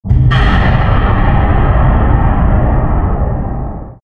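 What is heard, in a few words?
mysterious percussion, halloween; Garry's Mod; tf2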